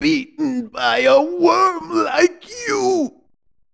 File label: death; frieza